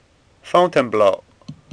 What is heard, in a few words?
fountain; National Gallery of Art - Washington